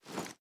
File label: reload fast start, sh; gmod; wfoly plr sh charlie725